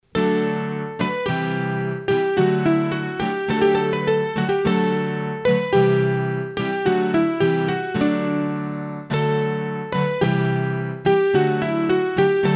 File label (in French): Bourree de Cusset, hurdy gurdy; trad; Bourrees a 2 Temp